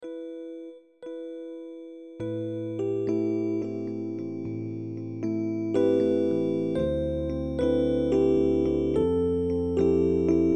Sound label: melody